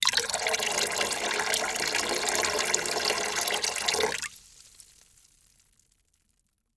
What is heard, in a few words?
Liquid Water Pouring Into Water In Plastic Bucket Fast Small Stream